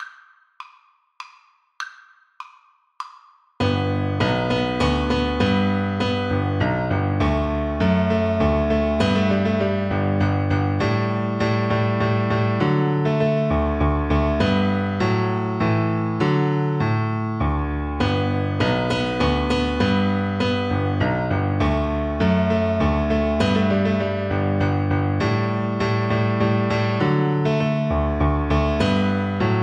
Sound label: minuet from don giovanni viola